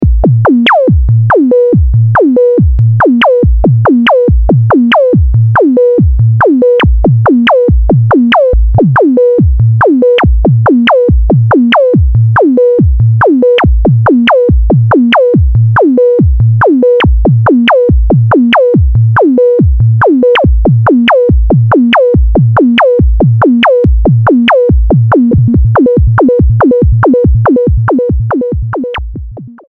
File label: sync LFO; Quad lfo